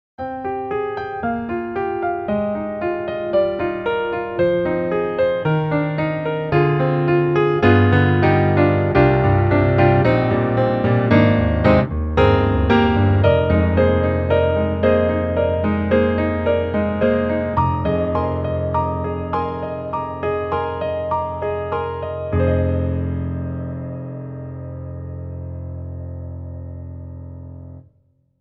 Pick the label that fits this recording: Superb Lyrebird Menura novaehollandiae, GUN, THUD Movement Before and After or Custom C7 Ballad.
Custom C7 Ballad